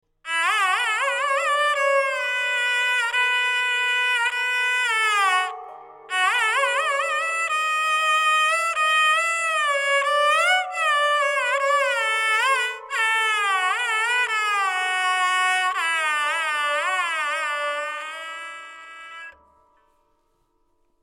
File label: dilruba